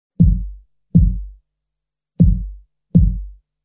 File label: bass drum with batter click